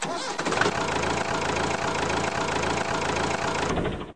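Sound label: small diesel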